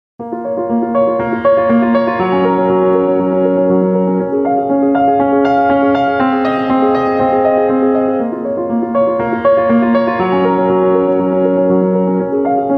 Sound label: Emotional Cinematic; An Emotional Cinematic Pack; audiojungle